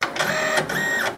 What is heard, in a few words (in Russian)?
korotkij zvuk raboti printera